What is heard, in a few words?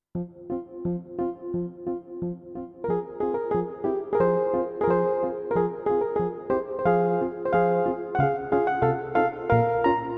Mellow EP